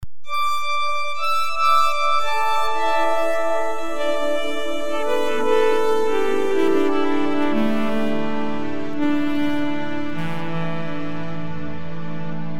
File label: Ambient; Zephyrus; Illusion